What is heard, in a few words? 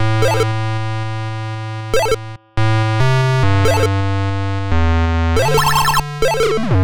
Synth Am, 140 BPM; loops; CHIPSHOP DELUXE